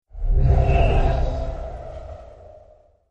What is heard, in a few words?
slider